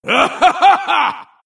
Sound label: laugh; dota2; Brew